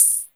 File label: PERC, 160 BPM; Ueberschall; Drum & Bass Flash Trackz